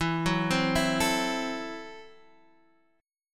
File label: A-Minor 6th E, chord